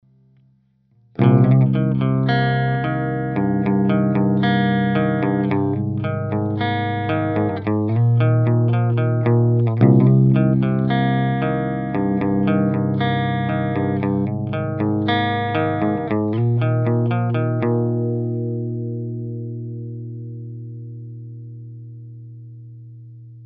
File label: guit